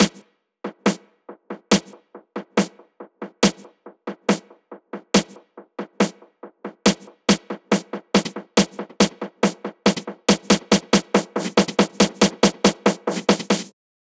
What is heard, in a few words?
140BPM SNARE; Vengeance Samples; Snares&FX Pairs